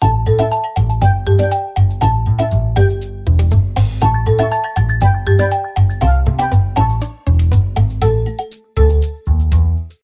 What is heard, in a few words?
Idle Loop; chick chick boom chicken battle; wiiware